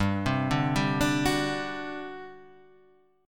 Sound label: G Major 7th Suspended 4th, G, down